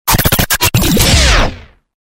Galery Noise; Sound Ideas - Galery Noise 002

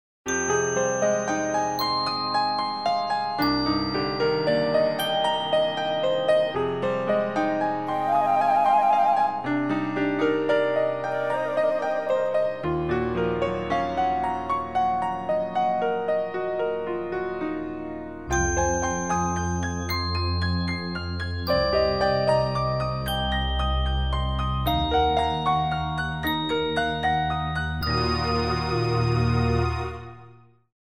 Easy Listening & Classical; Sound Ideas

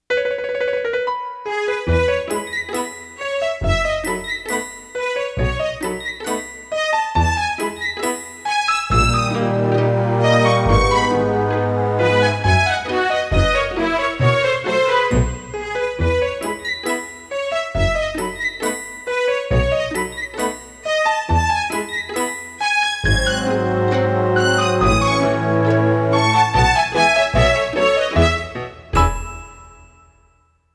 Comedy Style; Sound Ideas - Comedy Styles

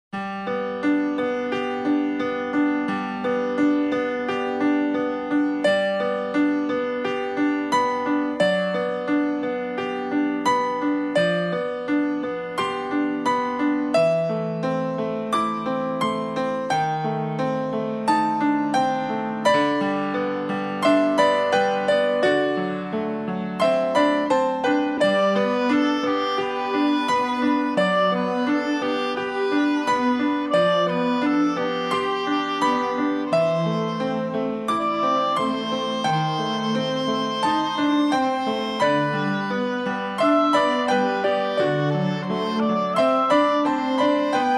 Easy Listening & Classical; Sound Ideas

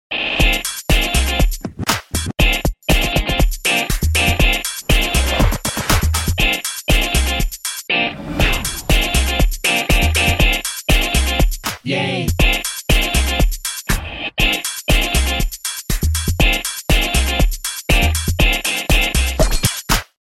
bed funky clap

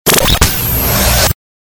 Galery Noise; Sound Ideas - Galery Noise 001

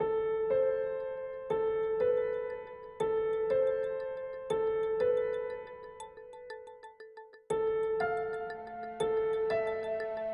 piano; think driving in the cold rain

